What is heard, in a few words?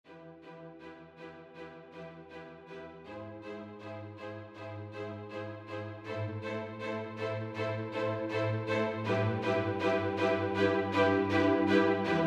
String Ensemble